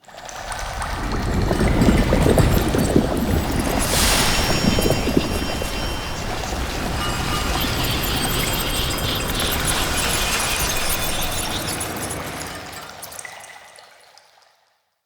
Witch Station Reveal; Soulplane

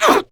Pain, Female; Warframe; Grineer Female; Battle Barks

